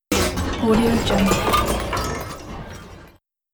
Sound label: zany pinball boing; cartoon